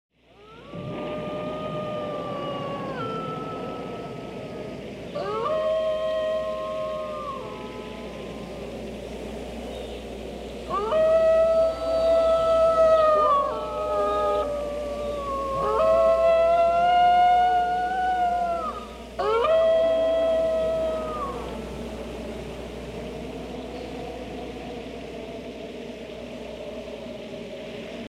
heulende wölfe; Horrorgeraeusche

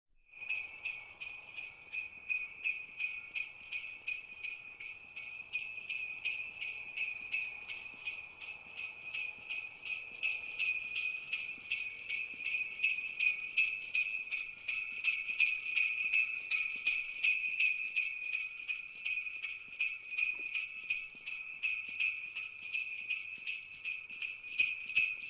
schlittenglöckchen; Geraeusche; Feste Feiern; der weihnachtsmann kommt

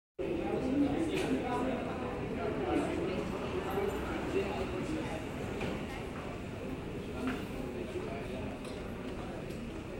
ticketschalter, Am Flughafen

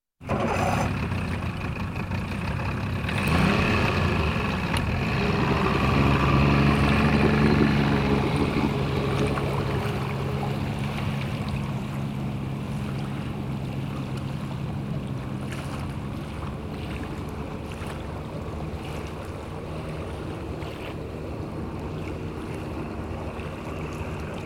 motorboot startet und verlässt den hafen

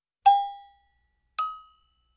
go go glocken; Suedsee

